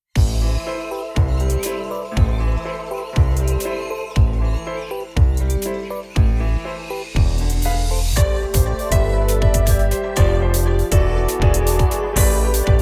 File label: Rotten Forest